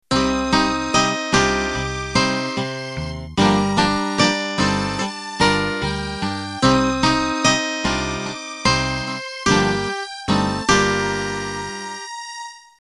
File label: 8Bt Standard Intro